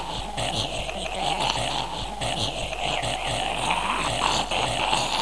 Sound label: fast zombie attack; escape series